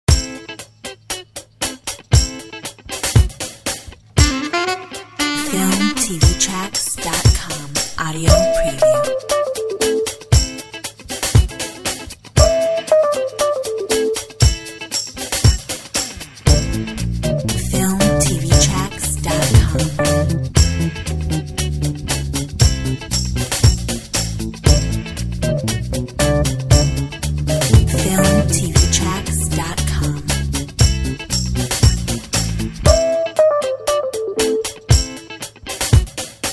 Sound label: Funk